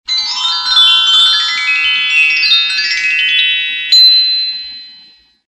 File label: bells chromatic; ring tones